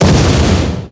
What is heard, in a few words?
ROCK